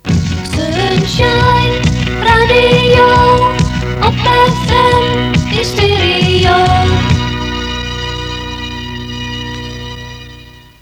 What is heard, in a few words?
sun Shine Jingle; SunshineJingles